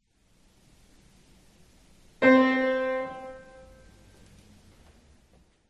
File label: Scale work last note